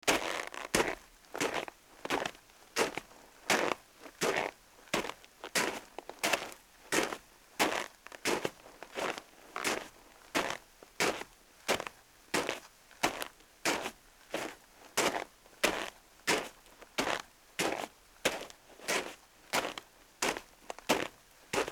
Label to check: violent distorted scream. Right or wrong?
Wrong